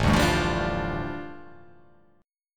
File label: A 7th Suspended 4th Sharp 5th, A, down